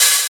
natural open hat c key, Royality free open hi hat sound tuned to the C note. Loudest frequency: 6947Hz